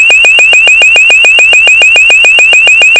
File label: tone